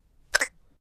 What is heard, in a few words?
rubber toy short